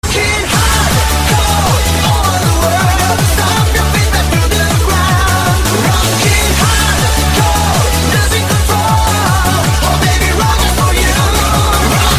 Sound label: Rocking hardcore